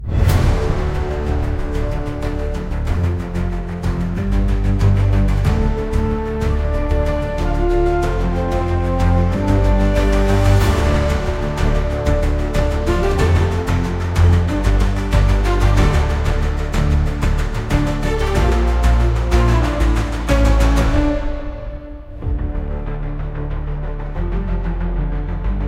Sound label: Dark Pulse